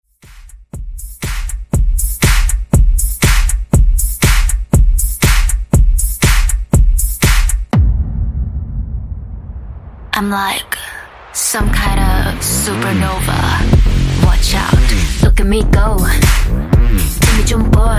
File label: 120 BPM